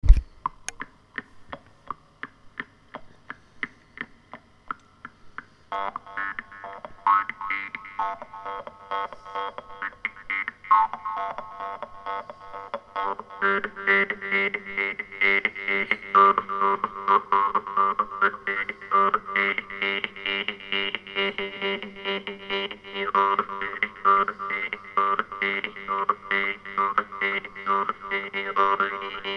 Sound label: Psychedelic